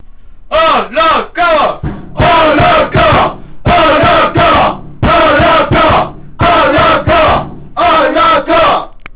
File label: call